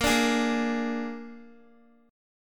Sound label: Bb 7th Bb down Guitar 4E,4A,5D,5G,5C,6F; Bb7 strummed